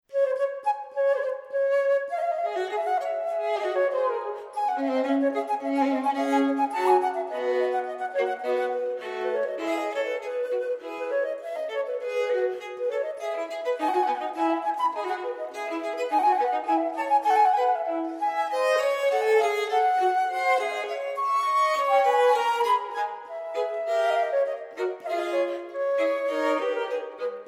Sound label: Sonata 4 in D minor - Allegro, Flute and Violin; Joseph Bodin de Boismortier; Six Sonatas for Flute and Violin - Opus 51